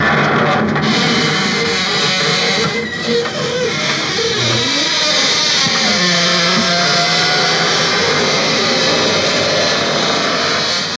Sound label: Metal Being Crushed